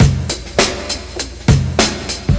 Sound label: classic brk; VIBRIS CLASSIC BREAKS